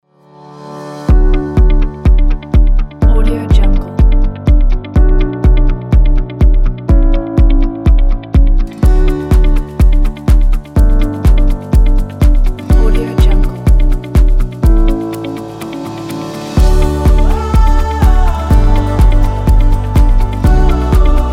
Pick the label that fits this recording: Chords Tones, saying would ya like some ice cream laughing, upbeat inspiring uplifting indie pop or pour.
upbeat inspiring uplifting indie pop